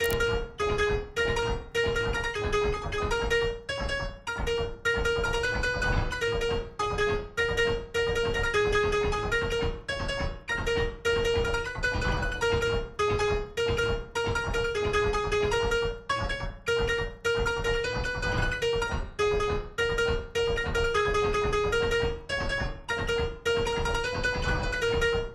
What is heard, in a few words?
Drop Synth DRY NO SC; Drop Synth 3